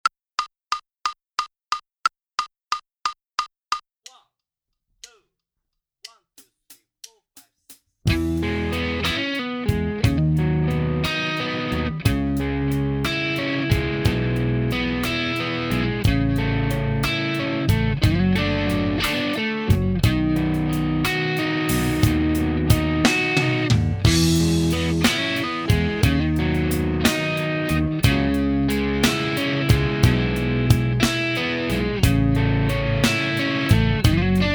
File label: Minus Keyboard; Inst Ensemble